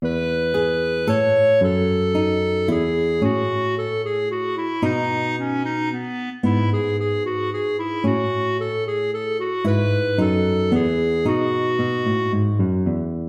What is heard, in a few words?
minuet major